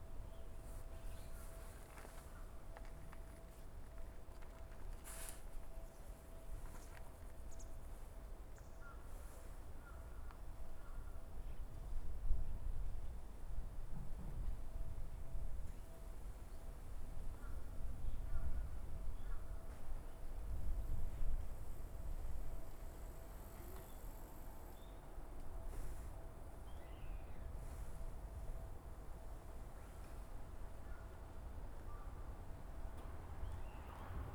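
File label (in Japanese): Stereo, 電車通過; RODE NT-SF1をステレオに変換し; ZOOM F6 MultiTrack Field Recorder; サウンドフォージでノーマライズしました。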